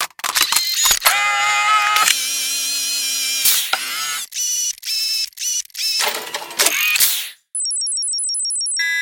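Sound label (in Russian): бешеные звуки зависшего цифрового фотоаппарата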